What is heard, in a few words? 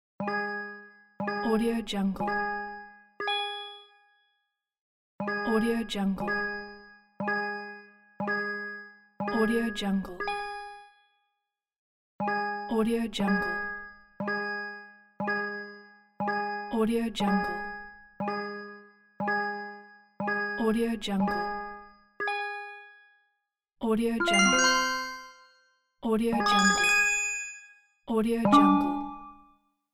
countdown